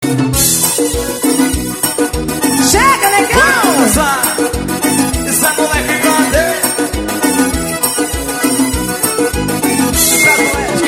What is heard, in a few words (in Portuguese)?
forro ao vivo